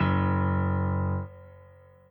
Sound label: chord